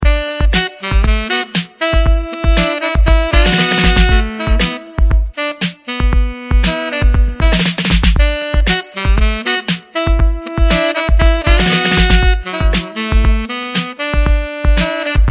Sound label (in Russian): muzika s saksofonom; muzyka s saksofonom